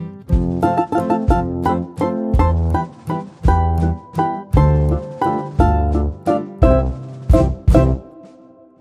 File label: Fraulines Waltz, Sting